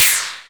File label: noise fx; Masterbits; Switch FX